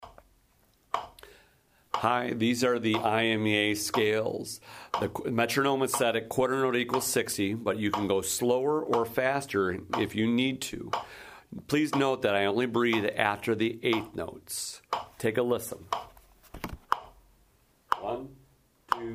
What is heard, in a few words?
scales; imea